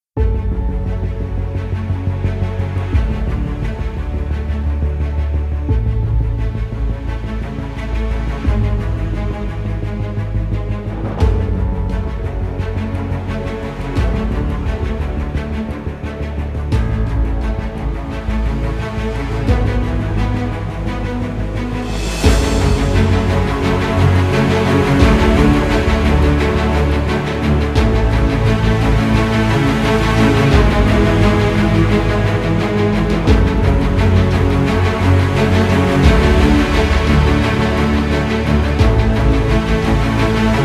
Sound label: Epic Dramatic, ringtone